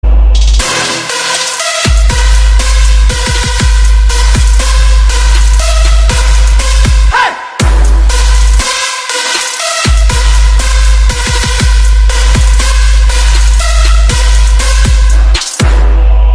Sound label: trance ringtone